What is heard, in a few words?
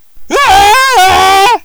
scream